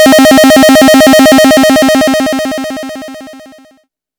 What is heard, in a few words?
fx dub siren; M8; M8JUNGLEPAK1